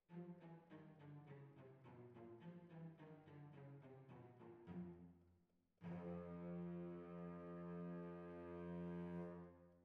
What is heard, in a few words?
Violins Over